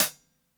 HH SOFT, HI HATS; BASEMENT DRUMS VOL 2

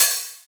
Open Hihat; Drums; Cymbal; House; Best Service; House Definition VOL-1; Cymbal Stuff

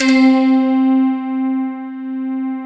PICK C4, Synth; LITPICK; Big Fish Audio; Synth City; JDSTACKY

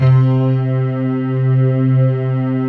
MUTE PNOS, JV PADS; Pro-Rec - Synthsonic

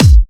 Dubstep Kicks; Bassdrums; Vengeance - Essential Clubsounds Vol.5

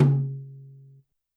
Tom Brush, YAMAHA RM1X

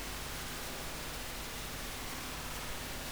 Tape Noise

